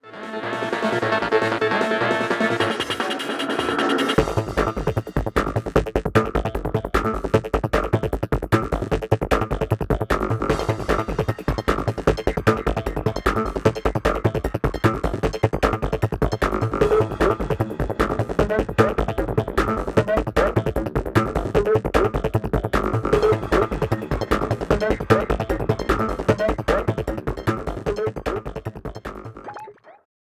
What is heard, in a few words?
BoomBox loop